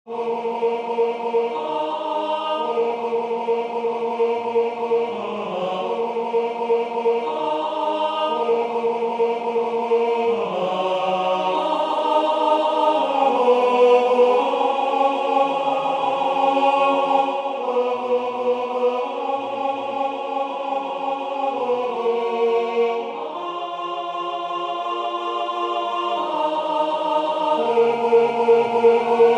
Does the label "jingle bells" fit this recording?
No